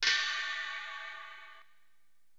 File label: LO CRASH; HipHop Fx; LiveAct Pool 1